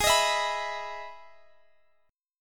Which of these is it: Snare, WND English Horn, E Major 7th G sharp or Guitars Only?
E Major 7th G sharp